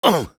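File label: pain sharp, French; Engineer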